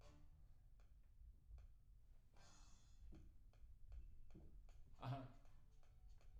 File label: PIANO